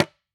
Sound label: dhi, mrid